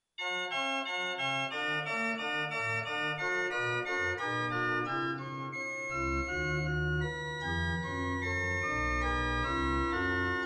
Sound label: Gavotte Sol; Corelli Ar